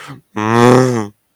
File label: cow death; svencoop; cow tycoon; gonome replace